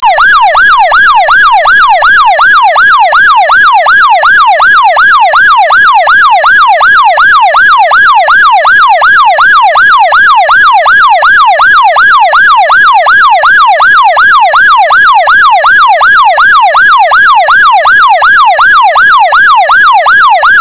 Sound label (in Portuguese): sirene